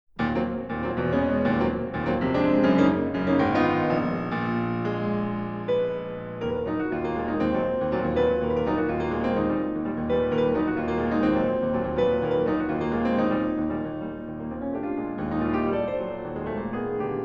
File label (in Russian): Фортепианная прелюдия Allegro ben ritmato e deciso; Гершвин